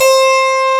HARPSICH

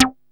JU 106, SEQ-FX; Masterbits; Vintage Voltage

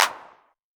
909 Clap, Novation Drumstation; Novation DrumstationV1

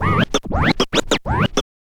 scratch kit, vinyl; Masterbits; Vinyl Attack; scratches

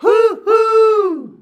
HUHUUUH, MALES; Best Service; Hyper Dance

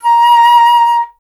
FLT A#; Miroslav Vitous - Solo Instruments VOL-2

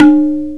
HIGH DHOLAK; Greytsounds; INDIAN PERC

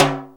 TIMBALE LO; Back In Time Records - Big Bang

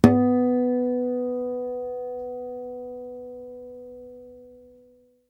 NYLON HARMO, Nylon Guitar

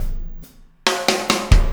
Impact Crater Beat, Processed Drumkits

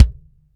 BD, Bassdrums, Roland TD-20

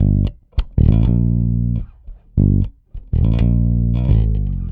Rock, Bass; Bass X

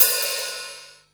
hat, Techno 138 BPM

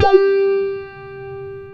WOW GT1-G3, WOW GUITAR; AKAI S-Series CD-ROM Sound Library VOL-7

